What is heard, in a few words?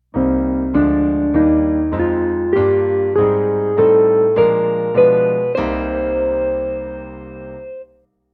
Minora Diatonic; Garmonizazya